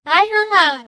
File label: semi realistic, walk